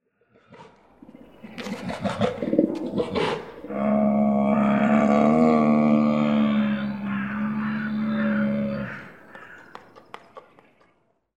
Eisbaer; ZOO; tierstimmen saeugetiere

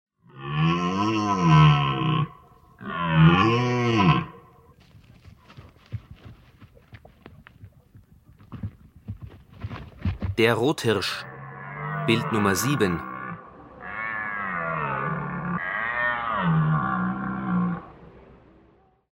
Rothirsch; heimische tierstimmen; lurche; saeugetiere lurche insekten

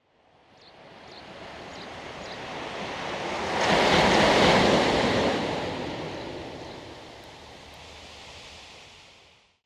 Meeresbrandung; Wasser; die quelle des lebens wasser

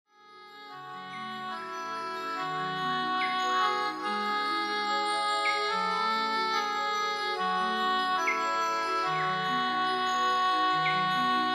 Instrumentalmusik; musique instrumental

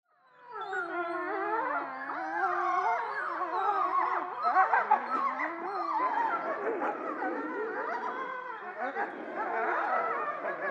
Wolf, Chorheulen Frequenzmodulationen; Woelfe Schakale Hunde; les voix des loups chacals et chiens

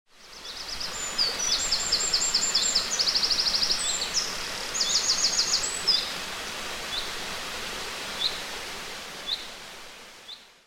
Wasserfall; vogelwelt suden frankreichs

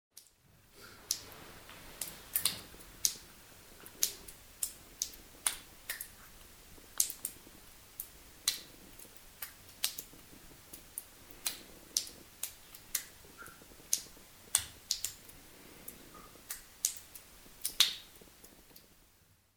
In der Wendelsteinhoehle; Geraeusche

